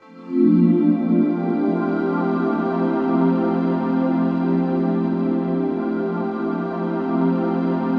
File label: Pad F major